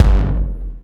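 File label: bass drum, right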